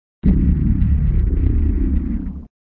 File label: Sound SFX, flipper; sttng